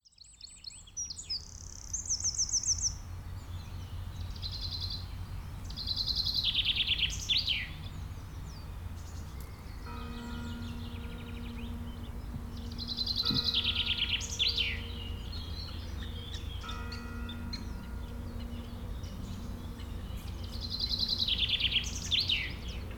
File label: ambience